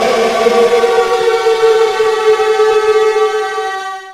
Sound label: jumpscare sound; fnafredbear funland